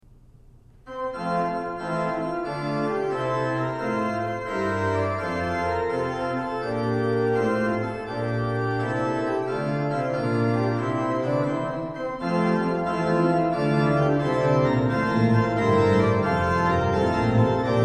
A Happy Postlude, organ; postludes

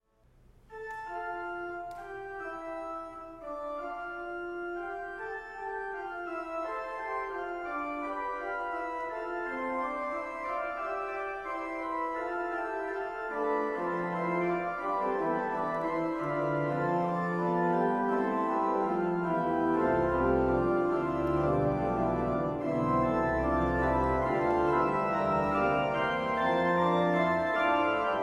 Fugue, organ; Angela

